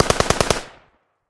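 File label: machine gun sounds; zvuki avtomata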